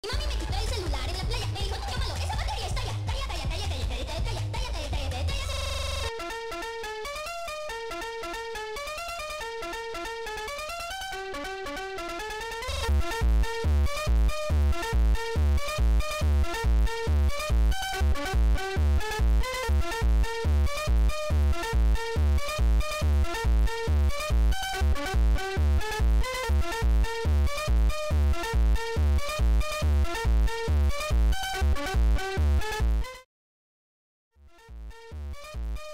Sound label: funk bassboost